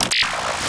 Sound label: button; hl2; buttons